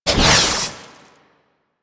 Heat Gun Retract, Weapons; Grineer

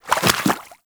Small Walk Water, Footsteps; Enemies; Warframe

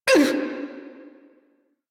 Pain, Male; Dialog; Warframe; Railjack Crew; Perrin Sequence

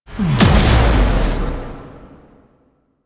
Exalted Sniper Cast Impact; Warframe; Warframes; Frumentarius

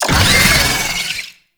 Boss Vocal Pain; Warframe; Oraxia

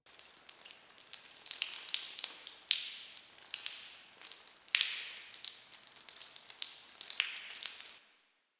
puddle drip loop; Ambience; Props; Warframe; Duviri

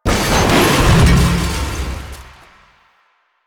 Van Flip; Warframe; NineteenNinetyNine

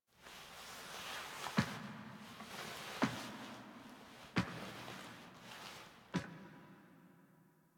Mirror Foley Enter; The War Within; Operator

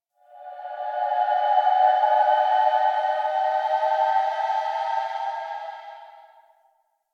Railjack exterior space whistles; Ambience; Warframe

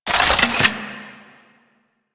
Fulmin Prime Primary Switch 3D, Weapons; Warframe

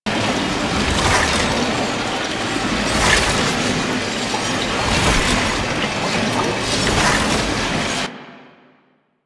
Alchemy Transmuter Active Loop; Warframe; Entrati

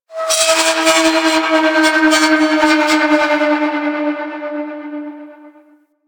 Metal Groans And Scrapes; Ambience; Warframe; Grineer Asteroid

